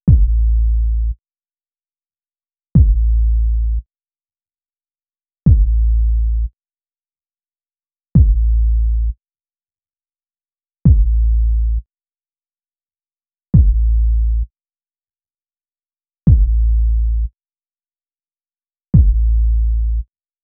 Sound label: Synth Bass